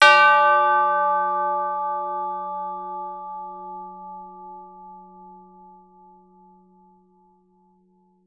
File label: TBL LG D4, TBL LONG; Advanced Orchestra